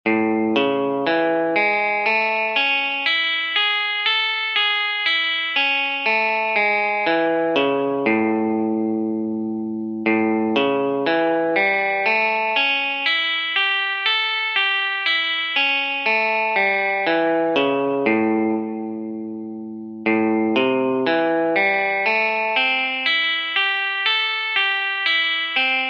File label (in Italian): Arpeggi in La